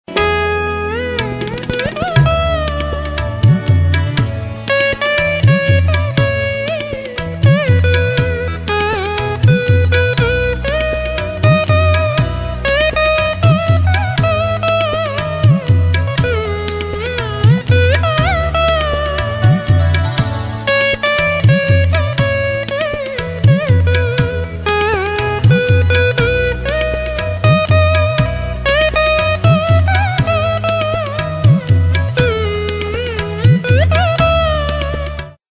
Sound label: Hindi Instrumental